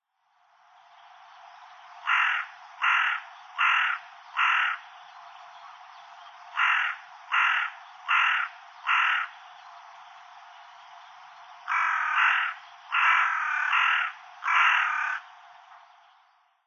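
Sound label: Carrion Crow; bosogarasu; watarase; koe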